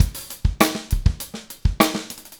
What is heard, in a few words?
Funkified, Rock Drums